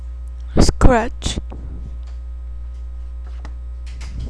scratch

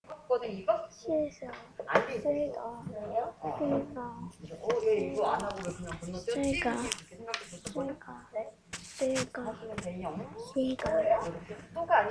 seagull

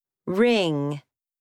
ring

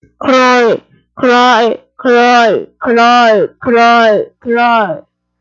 cry

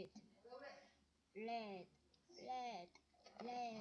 lid

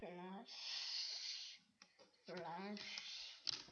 flash

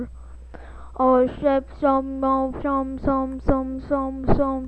swarm

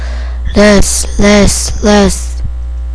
laugh

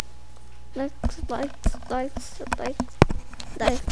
knife

